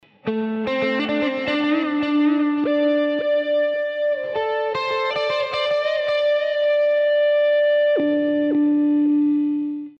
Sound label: Ricochet